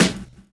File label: drum chop; AMG Gota Yashiki Groove Activator